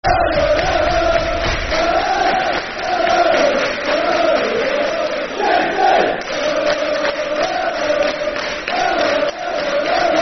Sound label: Der Der Der, Football Chants (Soccer Songs); Cardiff City; fanchants-com